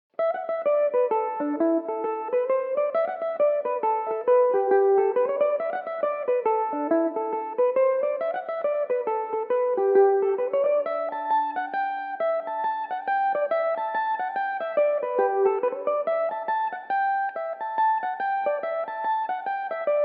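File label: A dorian, irish mandolin; rocky road to dublin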